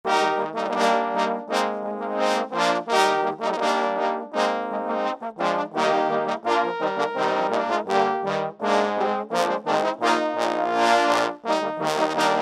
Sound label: Fanfare, trombone ensemble; Bello Gallico